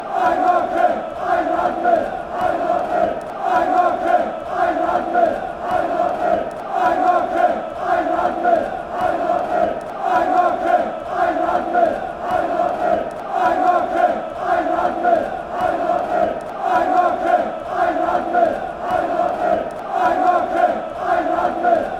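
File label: fanchants, football chants; einmachen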